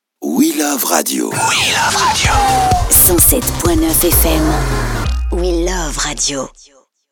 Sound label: JINGLE